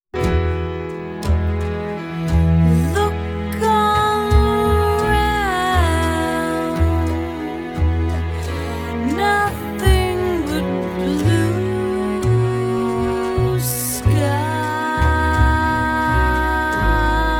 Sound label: jazz